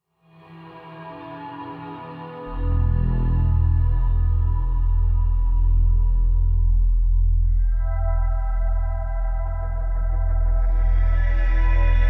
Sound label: Synth Based Sci-Fi Cue; Bad Wolf